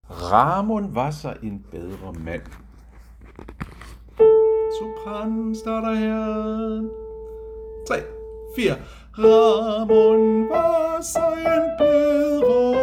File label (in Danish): sopran; harlev koret